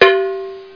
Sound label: Wrong button